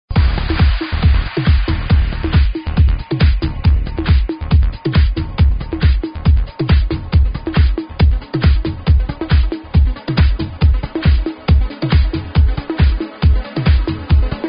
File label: kick; multitrax